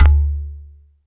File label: Perc, Alesis DM5